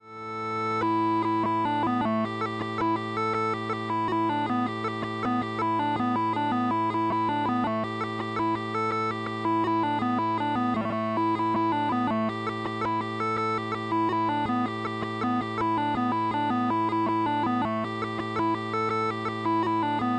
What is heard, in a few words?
Waltz